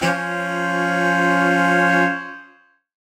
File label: Horn Swell Alt Fsus4min6; undercover samples